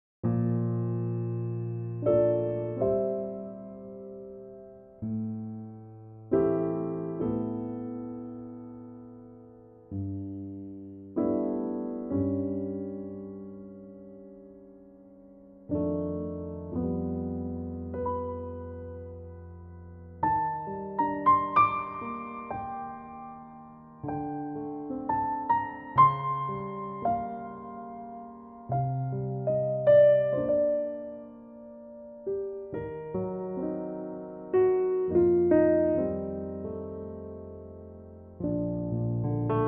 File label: Bb piano; Pianotrak; key - Bb - vocal range - Bb to Eb